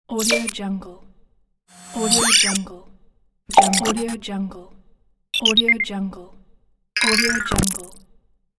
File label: comics sfx